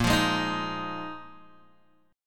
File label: Bb 7th Suspended 4th Bb Guitar Open G; x,3,3,1,4,1